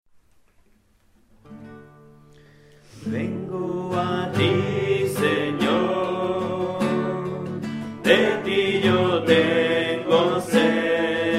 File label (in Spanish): Vengo a ti Señor guitarra